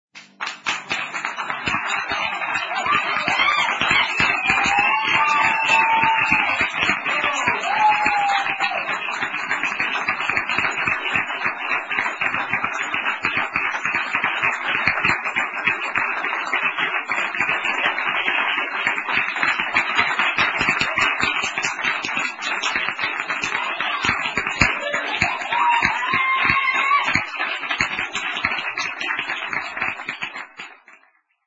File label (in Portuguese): Vinhetas Efeitos Sonoros Palmas